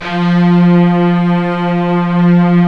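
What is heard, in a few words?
F3 MEGA STRINGS; ORCH.COMP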